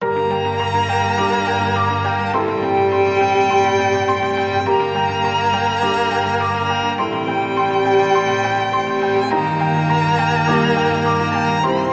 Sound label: Ambient